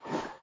Turn

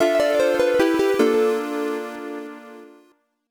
Harp - Aubade; Brandish VT; hoot; PC-98

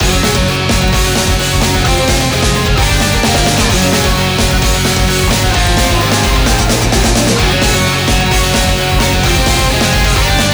Character Theme; Awesomenauts Ultimate Edition; Clunk

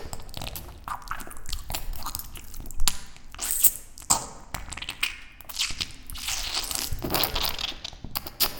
Coprs Oragnic Move; Puddle Plus

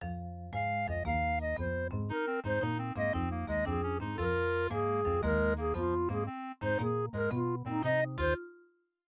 MIDI Animation stings

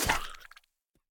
Worm; Super Mombo Quest

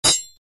Sword Poke

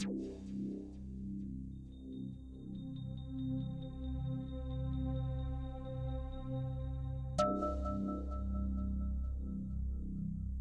Menu Theme

